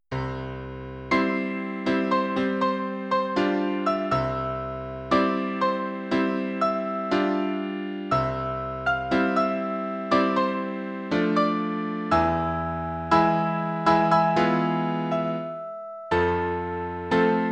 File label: Grand March